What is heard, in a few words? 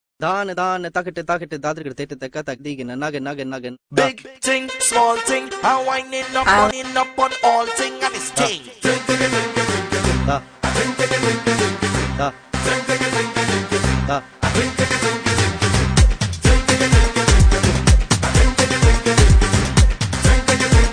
ting, soca